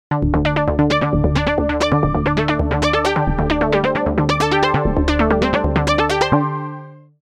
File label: jupiter 8 porta arpeggio; opxpro3